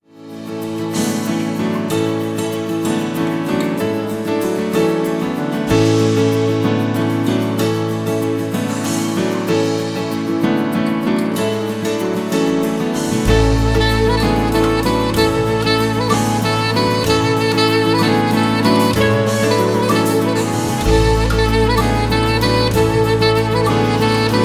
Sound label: Instrumental